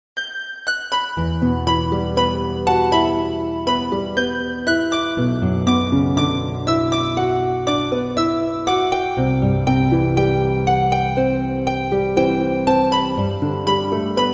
Fiction Beat

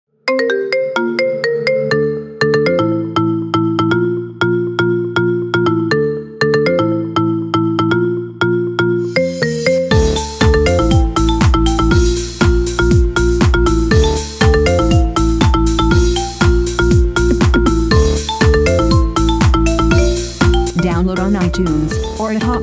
Marimba

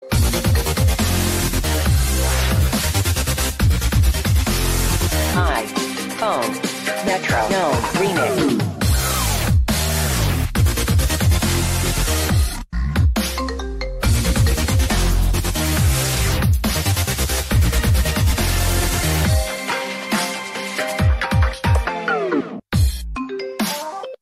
Iphone Remix Chorus

